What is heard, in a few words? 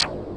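hollow percussion; Pro-Rec - Synthsonic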